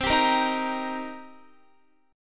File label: C Minor 7th C Guitar; Cm7 strummed